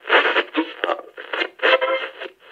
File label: Radio Tuning; Serious Sam 4